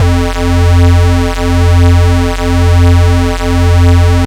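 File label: bass sustain F; Polyend; ARC Noise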